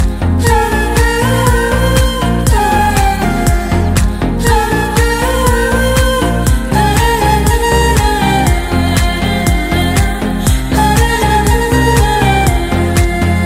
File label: dj remix ringtone, bollywood ringtones